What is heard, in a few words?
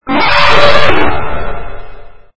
Elephant Call; Modular Sound-FX; David Ryle's Goofy FX sounds